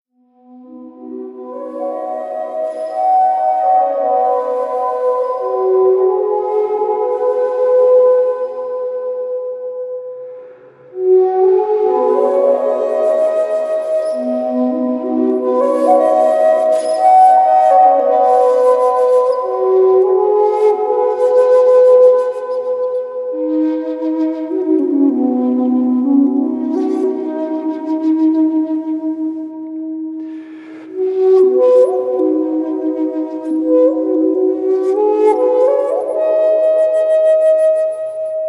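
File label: harp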